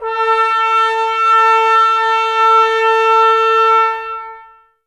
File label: brass, Tbs Mute